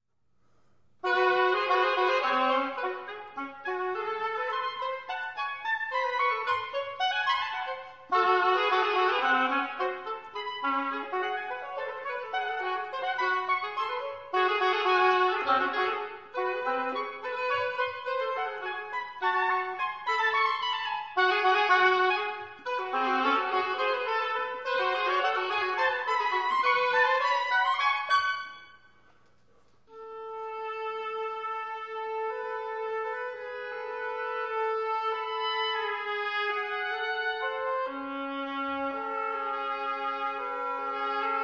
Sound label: Music For Two Oboes